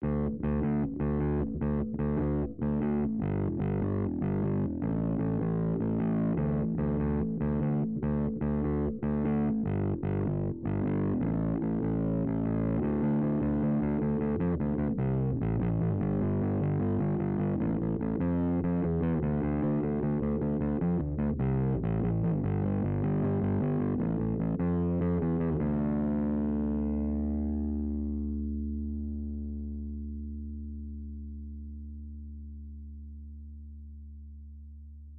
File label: bass baffle